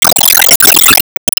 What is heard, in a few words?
Enemy Factory Active Shot; disney star smash android ios mobile gamerip 2020